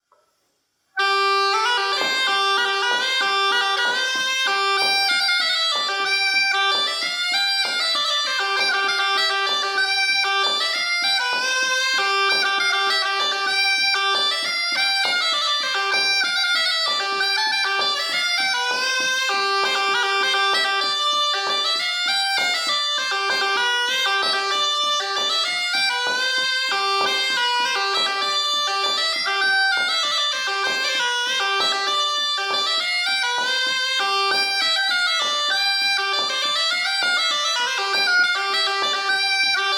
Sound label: cabreta